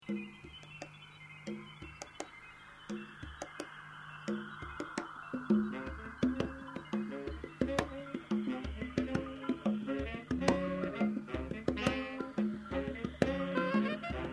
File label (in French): Fanfare; lasavanehier